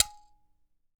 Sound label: G#4 mbira; WorldSounds